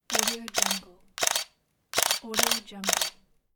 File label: camera shutter clicks; soundjay